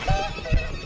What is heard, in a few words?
hee hee